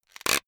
plastic slowly pressured

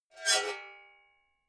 metal scrape rich fast

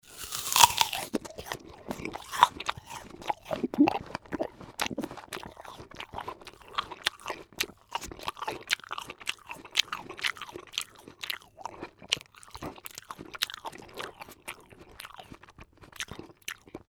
apple eat

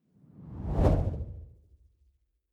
whoosh impact soft wobble low

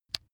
razor attachment remove

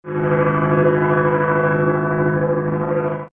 metal scrapes

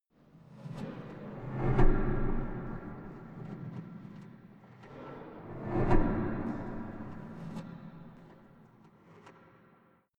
small impacts

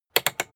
rotary control short turn hard

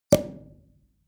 sparkling wine cork open soft

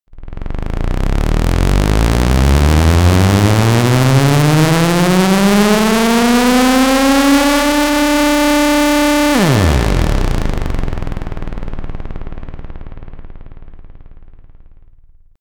bass synth siren

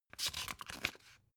old pick page

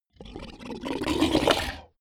beast gargle large hard

